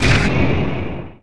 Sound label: magic missile; skibidi wizard